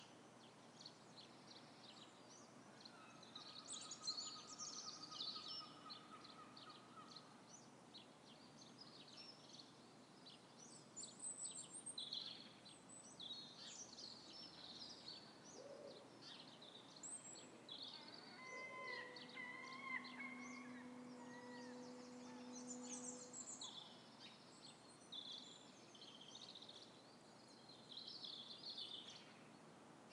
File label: spring morning, Dun Laoghaire